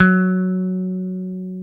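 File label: guitar, Dan Electro, 6 string; Dan-O 6 Str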